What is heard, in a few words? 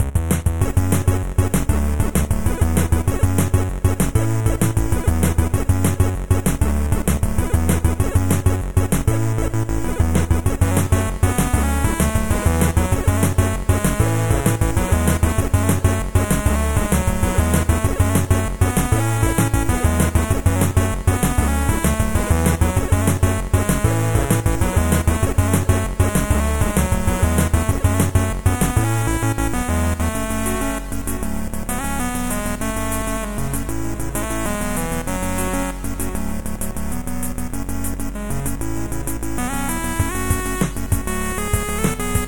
chiptunes, Music Beds